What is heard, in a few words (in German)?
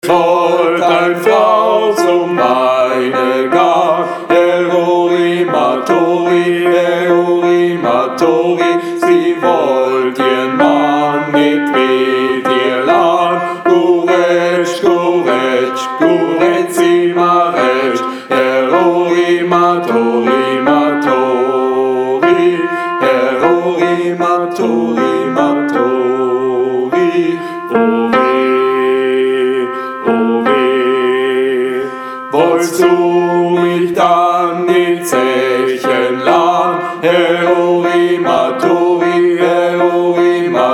BASS; Gesang; Es wollt ein frau zum weine gahn